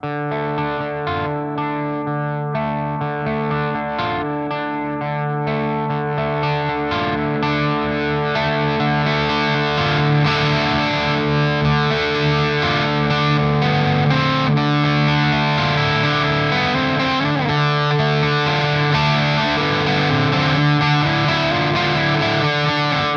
Gain Expression

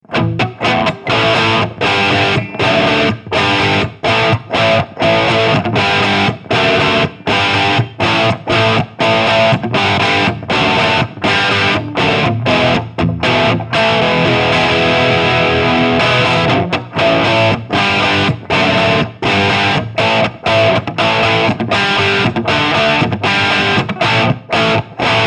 Metropoulos Blue Channel

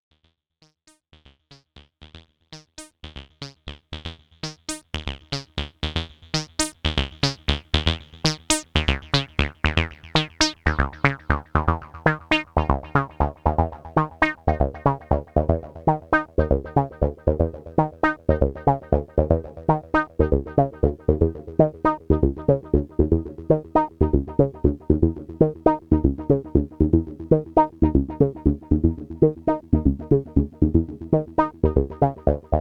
wasp fx Low Pass Sequ

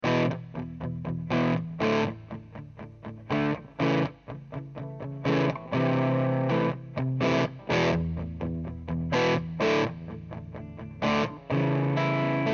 od250 gain 12h clean

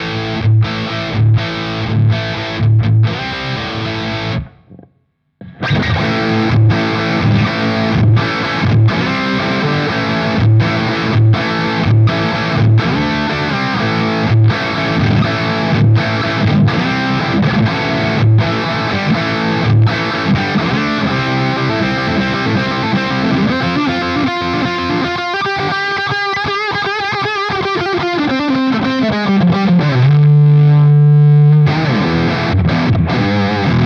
Vox Valvenergy Silk Drive FX Loop

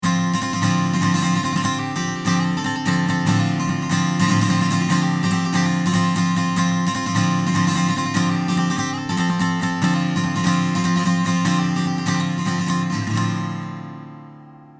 Chandler TG 1 Ac. Guit THD

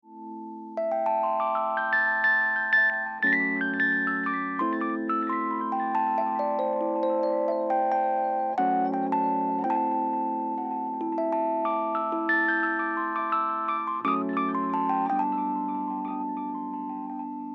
Rhodes

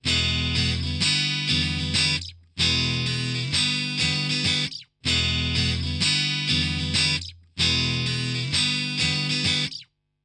Portico 5024 e606 silk egtr

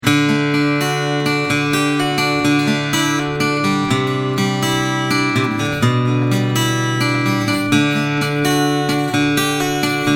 Picking Neck

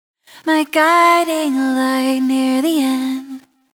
Vocals Dist Vocal Life; COLDFIRE

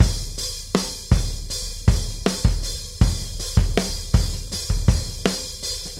Hard Rock Bridge; Zoom R20

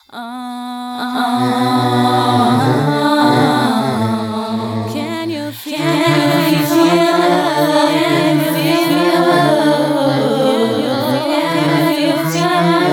Vocal Swarm; Dawesome LOVE 1.0.1

